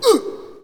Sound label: Hockey Male Hurt; Hockey Vocal Sounds